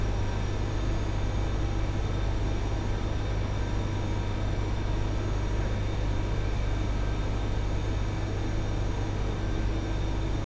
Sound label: turbo, noise